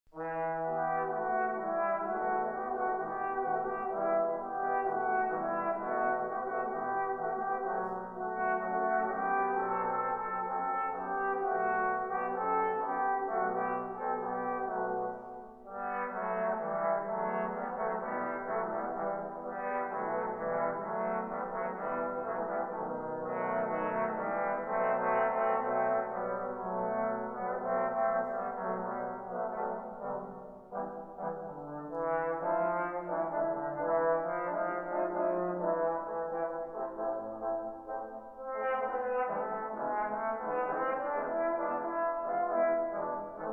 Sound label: trombone